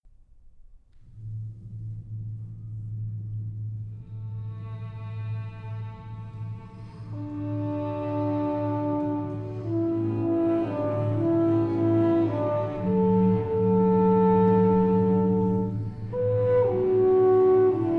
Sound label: Divertissement TIMPANI